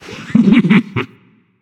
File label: hehheh; smite; ahpuch